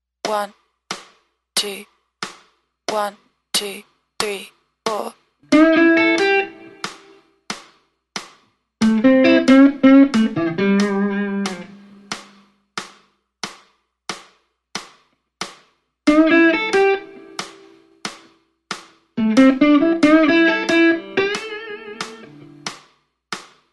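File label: metronome 4 count head with guitar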